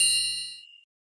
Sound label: Sfx Tennis